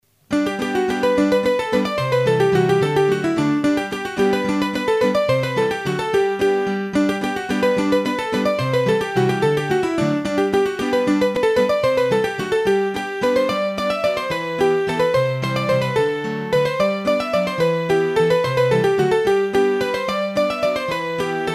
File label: Mazurka, hurdy gurdy; Massif Central; Mazurkas